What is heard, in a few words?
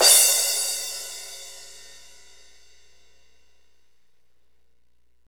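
CYM cymbal, Hip-Hop Kit; Northstar - Drumscapes Roland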